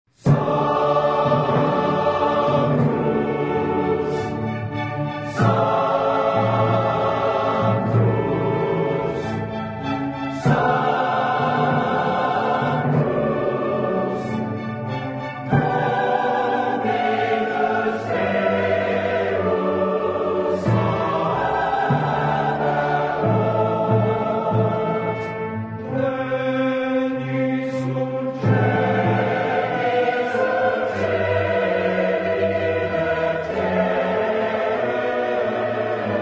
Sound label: Chancel Choir, Sanctus; Mozart Requiem